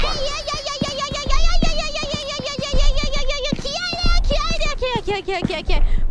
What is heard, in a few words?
AYAYAYAYAYAY; IRyScream